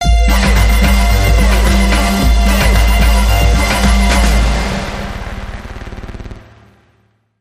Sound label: Jump Dub Sting